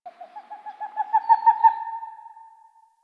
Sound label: forest bird mid; Ambience; Warframe; Grineer Forest